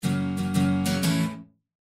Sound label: Acoustic Guitar